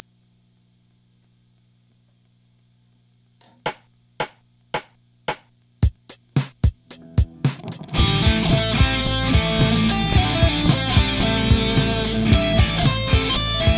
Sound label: solo, metal